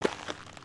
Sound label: Foot Dirt Sneaker Walk, footsteps